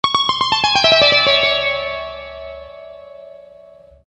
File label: sitar, Rake No Drone